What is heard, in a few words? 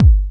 Bass Drum, EMU PX-7 Drum Machines